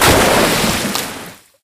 crocs jump out; Source Engine; tf; lair

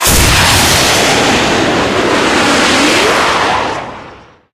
rocket pack boosters fire; tf

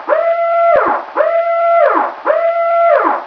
aheli megabomb siren, attack helicopter; Source Engine; video game; npc

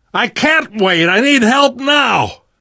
dying, survivor voice

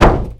metal barrel impact soft; Source Engine; video game; physics

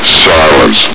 silence; Source Engine; bshift; hgrunt

